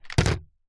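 household medicine vitamin c tablets plastic tub set down wood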